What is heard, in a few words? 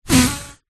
leisure party serpentine blowout hole broken; zapsplat